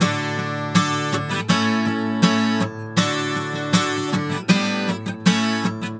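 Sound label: 160 D GUITAR, Celtic; GTR12; USB Soundscan vol.42 - Celtic Flavours; AKAI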